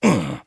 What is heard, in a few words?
Wound; Voljin